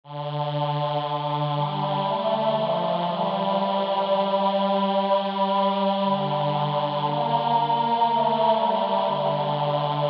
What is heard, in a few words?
Training Tenor; Argizaiola; J. Guerrero